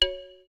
Volume, ui; Asus Zenfone-10

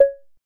Electronic; Realme 8

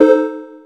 electric, keytone

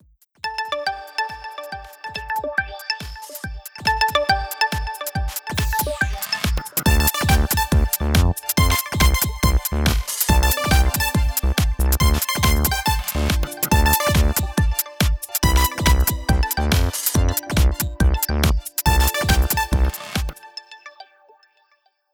Jump Chord; Vivo; X1; ringtones

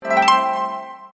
Welcome message

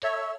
Star, Keytone sounds; LG GD550 Pure

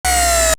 zoom out short; Samsung SGH-Z540

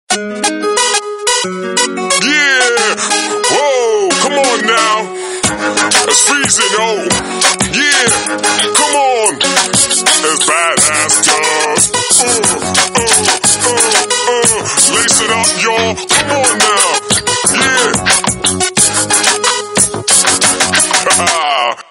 Hiphop Guy

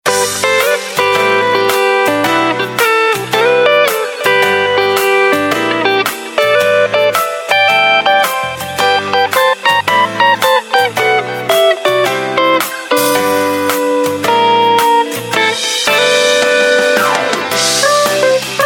Surf the Groove; LU9000 Arena

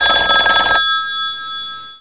Classic, Cisco Unified IP Phone 7945G

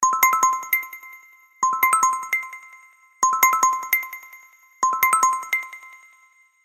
Crisp, alarms; Itel S25 Ultra

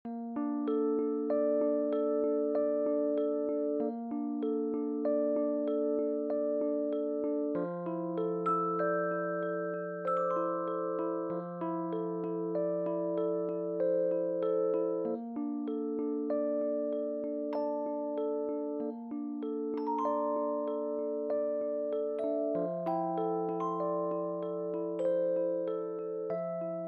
Walk by Moonlight, ringtones; LG; VELVET 2 Pro

